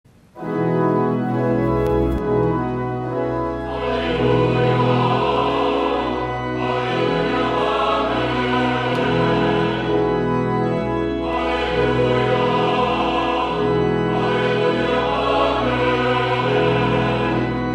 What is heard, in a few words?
Alleluia Amen